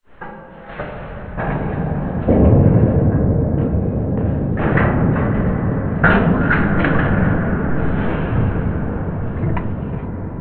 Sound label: big hit far